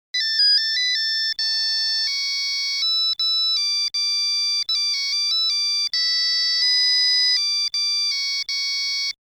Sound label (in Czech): beep; vejce nahnilicko; menuet; boccheriny